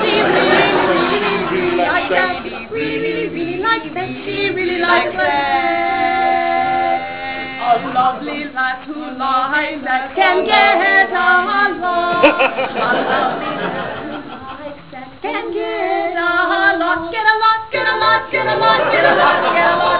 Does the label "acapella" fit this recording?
Yes